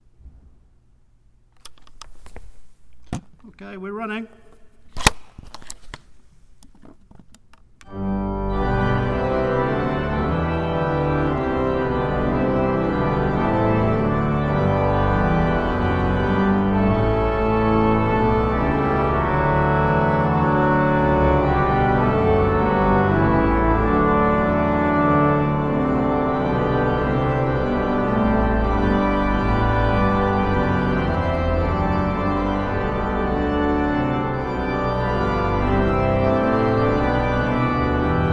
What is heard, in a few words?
Sine None 2 verses